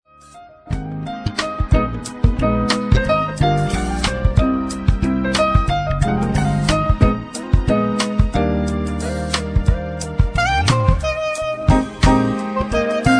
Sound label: just chillin no vocal